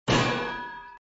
boem

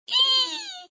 schrik natuur; The Netherlands

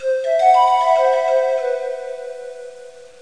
fluit voor de lange

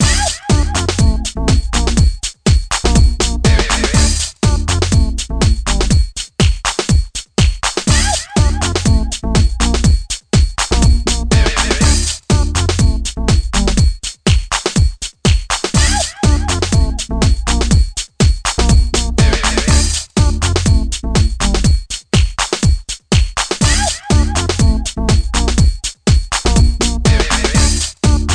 huis muzak; tinus

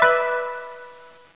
koto kort; OUTDOORS

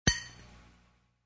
beeldhouwen

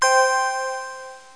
licht

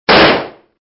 de knal; The Netherlands

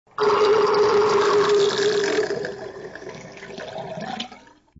leeglopen; The Netherlands

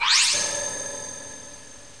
vuurtje

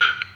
kraak; hooligan

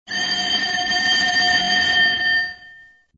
schoolbel

